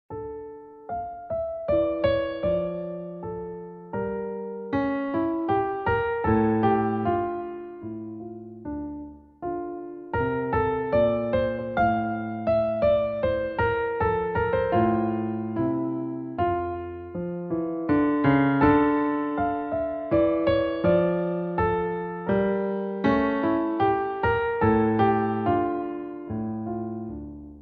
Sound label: Bach Minuet D Minor Slow